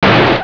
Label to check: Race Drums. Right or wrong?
Wrong